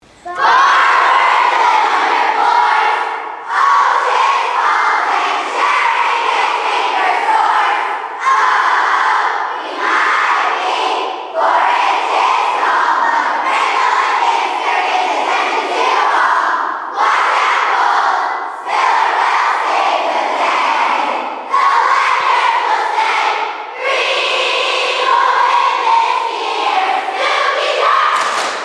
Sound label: Cheer